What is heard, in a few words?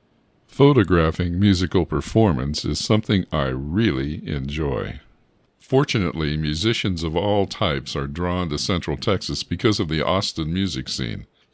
Jazz Night